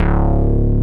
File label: SELECTED BASS; Masterbits; Dance Trax